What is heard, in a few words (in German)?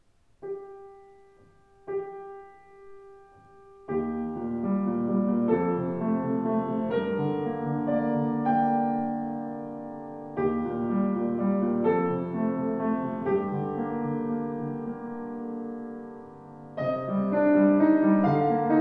Feurich Klang, Klavier; 116 feurich